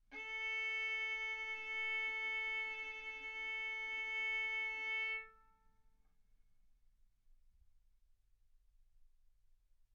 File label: Violin arco mf sul A A4 B4 stereo